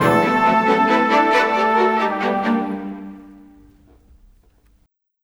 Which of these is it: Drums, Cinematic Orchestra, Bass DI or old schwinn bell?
Cinematic Orchestra